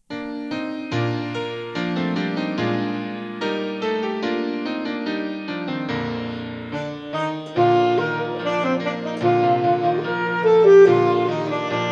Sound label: Celebrated Gavot; Martini